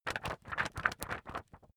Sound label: magazine wobble